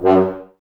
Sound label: F#, Brass, F.Horns, Woodwind Brass Ensemble; Sample CDs; Miroslav Vitous